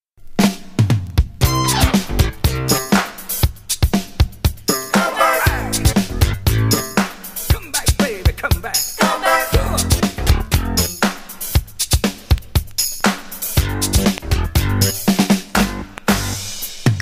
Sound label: OBSCURE FUNK